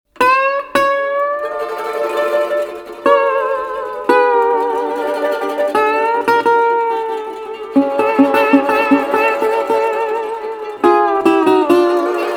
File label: ukulele